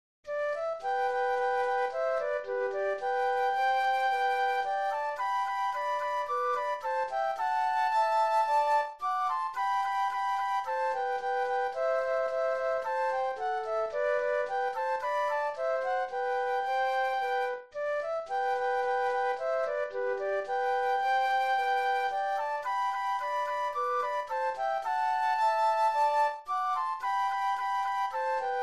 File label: flute duet